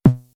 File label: snare, DL-909